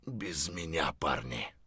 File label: hurt neardeath; Escape from Tarkov